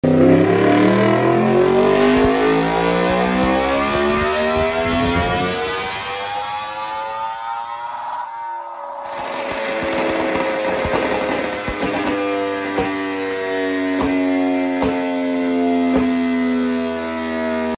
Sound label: Racing exhaust